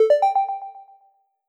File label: title transition; sandtrix